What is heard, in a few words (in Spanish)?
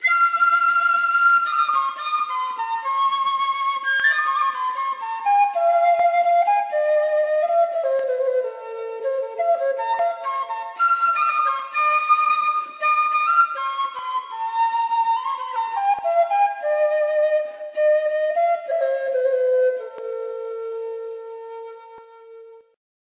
Quena Sola